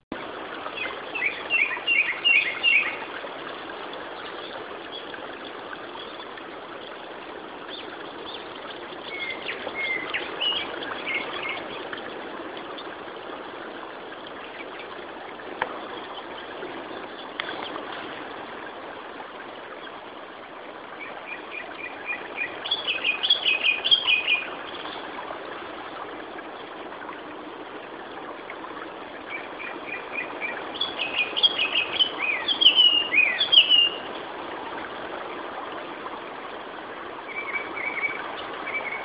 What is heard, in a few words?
Turdus cardis